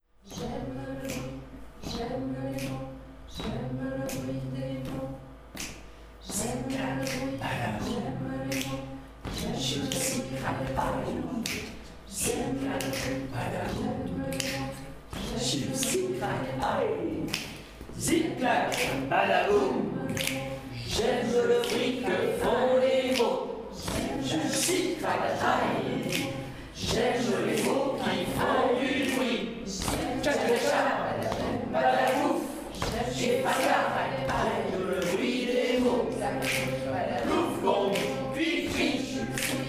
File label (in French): zip-clac